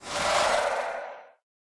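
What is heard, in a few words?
pterodactyl boss stun; pterodaktilj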